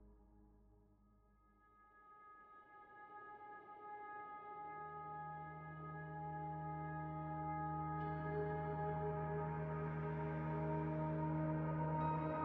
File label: theme